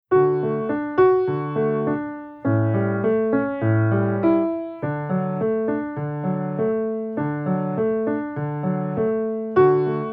Oefening met gebroken akkoord, Piano oefeningen

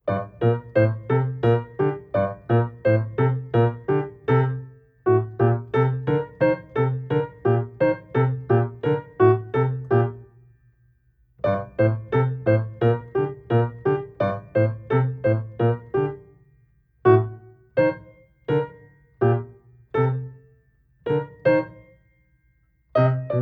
Staccatoarticulatie, Piano oefeningen

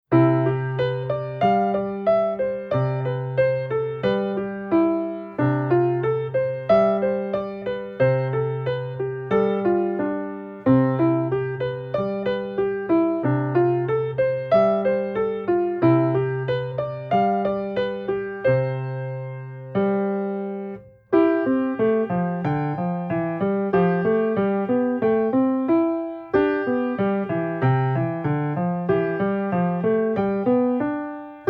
Sprongen met tertsen, Piano oefeningen

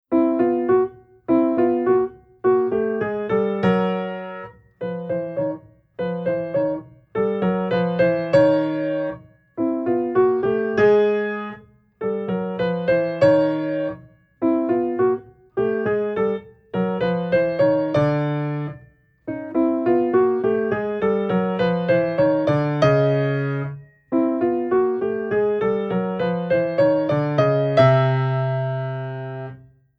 Chromatische beweging vanuit het, Piano

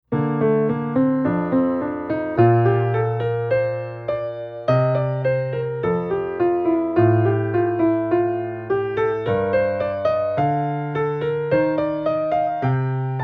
Passages met majeurtoonladders, Piano oefeningen

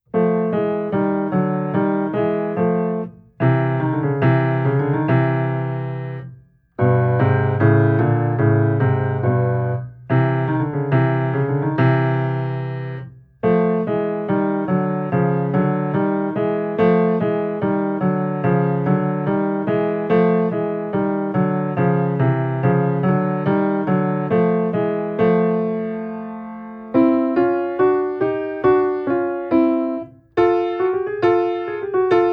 Vingercombinaties eerste en vier, Piano oefeningen

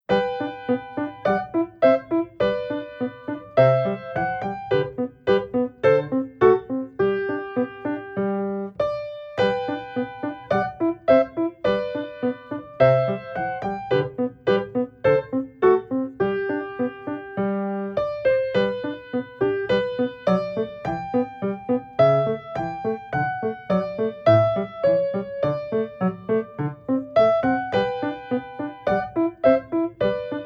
Oefening met de albertijnse bas, Piano oefeningen

